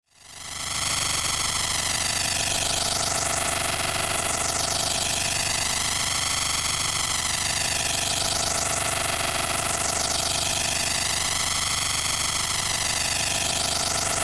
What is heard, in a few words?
Drone Electrical Static Flange; Blastwave